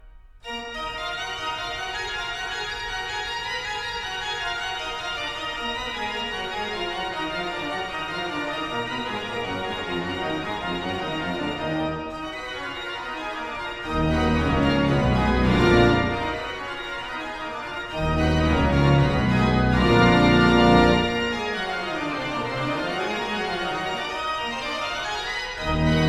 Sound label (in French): Orgue